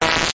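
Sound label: sound design electricity spark medium; zapsplat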